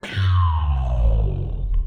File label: Time Slow; UnityTowerDefense; sound effects